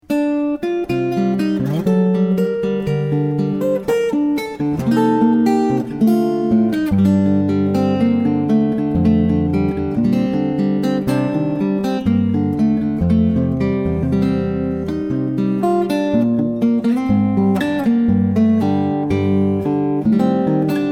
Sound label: archtop; 18" Engellman / Big Leaf Maple Archtop; Waverly / Kelly Coyle Playing His 18" Engellman / Big Leaf Maple Archtop